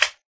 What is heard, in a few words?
small, magmacube; mob; Minecraft